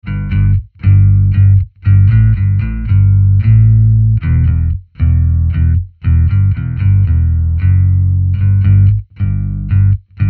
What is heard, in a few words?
Bass Guitar DRY